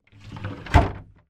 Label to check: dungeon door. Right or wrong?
Right